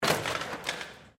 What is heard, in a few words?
Bicycle Mountain Bike Crash Concrete, Bike being thrown around to simulate crash impacts; Bicycles